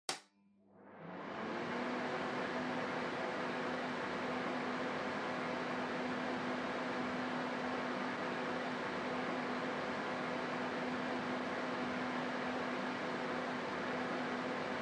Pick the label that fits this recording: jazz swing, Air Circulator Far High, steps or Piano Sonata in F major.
Air Circulator Far High